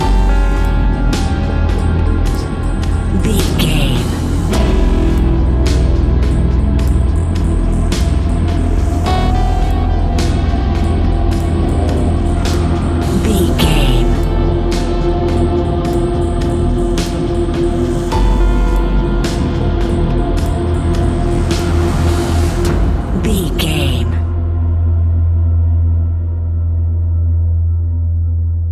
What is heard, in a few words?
horror haunting whispers